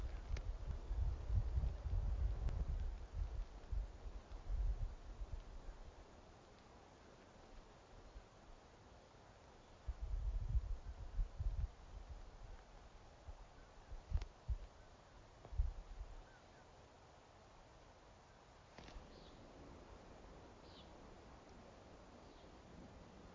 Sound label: Poorwill Nighthawk